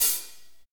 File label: hat, Power Ballad